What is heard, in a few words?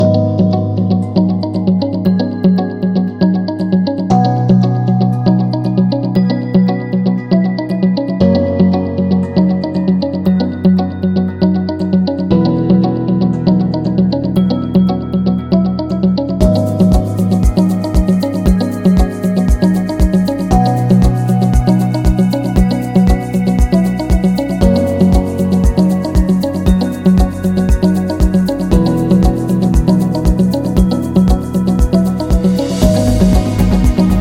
Luxury Music